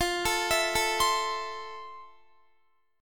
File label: F Major 7th, Guitar; FM7; x,20,19,21,21,19; 4E,4A,5D,5G,5C,6F; arpeggiated